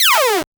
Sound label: make the enemy death soun, 8 bit